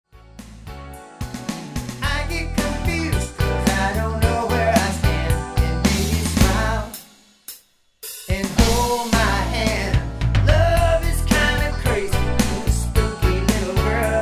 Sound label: Spooky